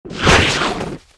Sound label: gangsi attack; Eft